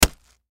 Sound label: Flesh Chop Impact Human Stab; Injury; Combat; Blastwave; Horror